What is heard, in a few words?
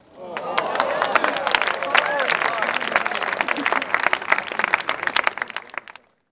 Golf Crowd Light Clap